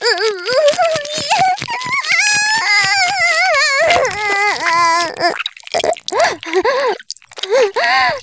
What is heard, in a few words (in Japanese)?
絶頂 中出し 潮吹き; 騎乗位